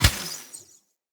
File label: Elemental Radiant Arrow Explosion, Bows; Weapons